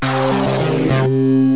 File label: board Dead; BeamWars 2.3.2; Arcade